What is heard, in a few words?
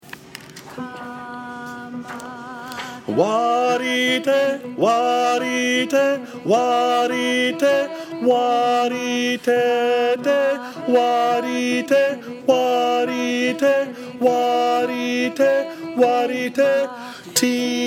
Tres Cantos Terceiro Tenor; Prince William All-County